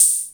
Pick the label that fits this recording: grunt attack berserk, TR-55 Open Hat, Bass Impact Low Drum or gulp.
TR-55 Open Hat